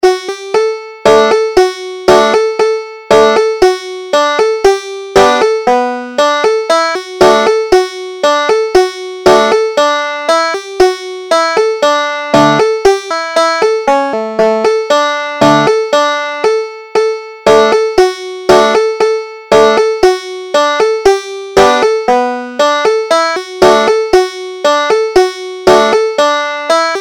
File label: rock the cradle joe, banjo